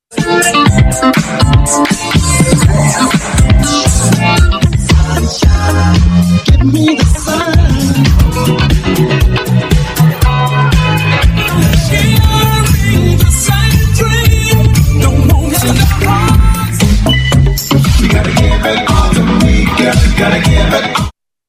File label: block party mix